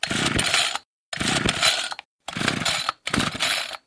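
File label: car starter sounds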